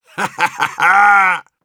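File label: laugh happy, Russian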